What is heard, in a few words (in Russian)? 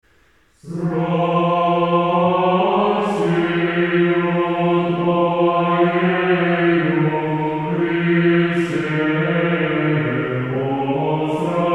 Стиховна 1 глас